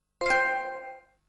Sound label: Trophy Chime; Ps3